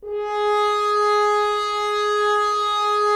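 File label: Solo French Horn, brass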